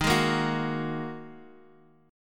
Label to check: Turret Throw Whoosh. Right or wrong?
Wrong